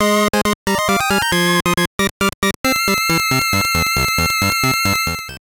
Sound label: Victory!; Wario Land II